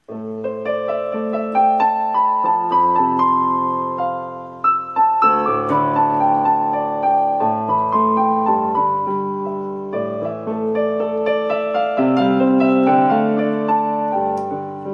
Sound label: Piano improvisation